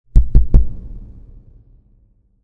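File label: sounds of finger tapping on a microphone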